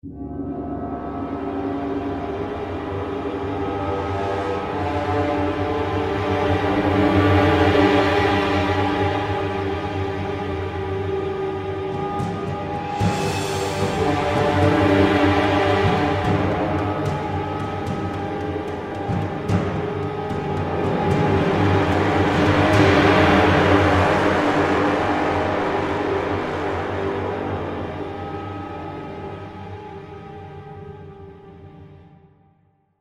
crash landing, music; Survival Island; Poptropica

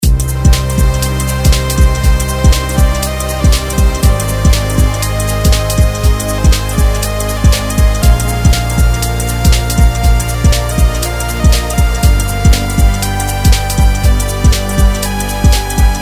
Techno Jam

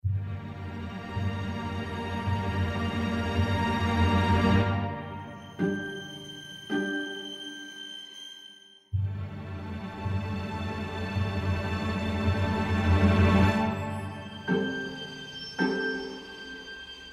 quiet suspense

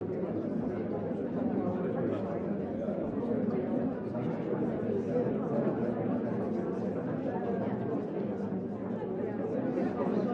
crowd muffled loop

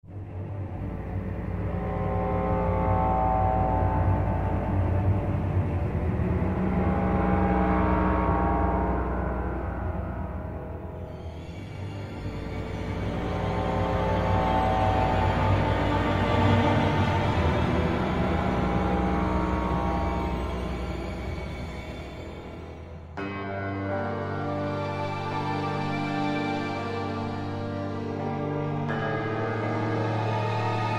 forest night

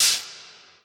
electric zap

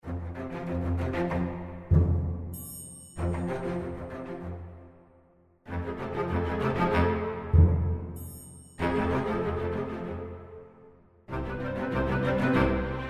calm seas

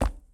concrete

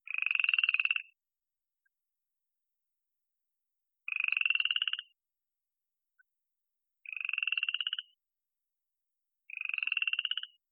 single frog

